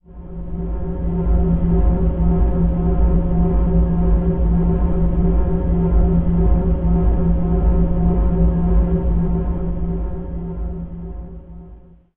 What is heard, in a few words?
temple drone F, ancient drones; Zero-G Seismic Frequencies; 90 s Sample CDs